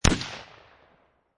Rifle Winchester 30-30 Single Shot Exterior At 45'